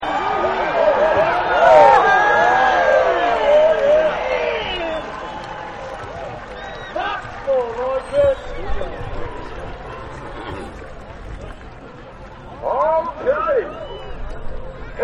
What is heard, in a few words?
Rodeo Ambience; Rodeos; Backgrounds; Partners In Rhyme